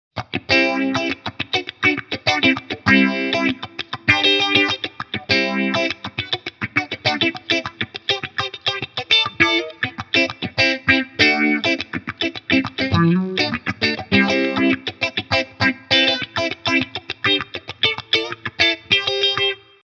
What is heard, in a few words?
Harley Benton MiniStomp Hot Mod Phaser